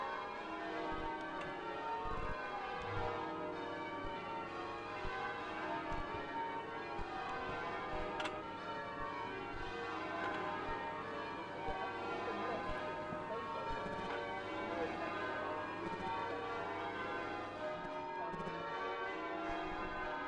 gloucester cathedral bells before the threechoirs opening service